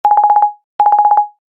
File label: Ring, ringtones